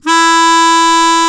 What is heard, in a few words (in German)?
MUNDHARMO; Alpine Volksmusik